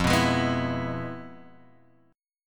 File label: F Minor Major 7th Flat 5th, F, Guitar, Open G